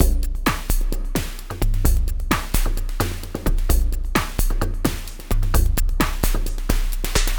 Terrestrial Hybrid, Processed Percussion

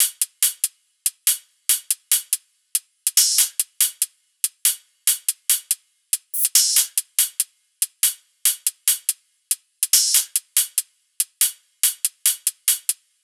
loop hat 142; Seismic Loops

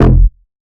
Bass F, Bass Synth

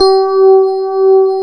RHDS C5, FM PIANOS

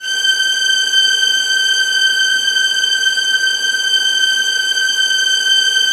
VIOLINS L, Synclavier Strings; ILIO

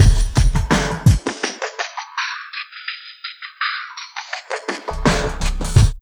filters; USB Soundscan vol.35; AKAI

